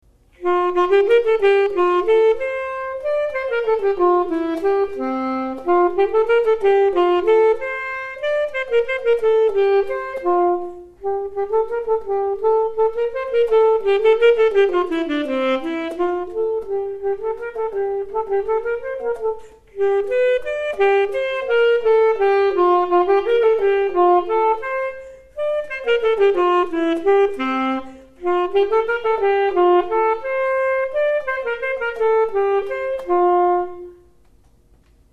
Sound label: alto sax